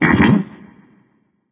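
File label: nonverbal agree; smite; voicepack; merlin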